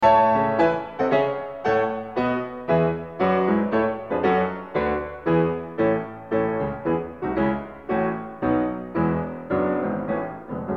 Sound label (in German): Kinderszenen für Klavier; Wilhelm Kempff; Op 15